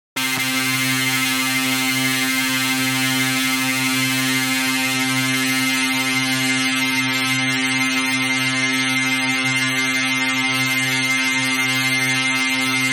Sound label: pad